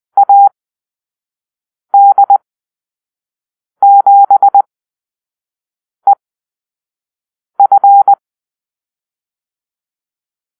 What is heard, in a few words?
Call-Sign Drill, morsecode